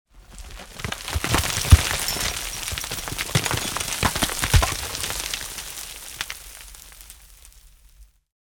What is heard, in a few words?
rockslide; Dystopia; meatspace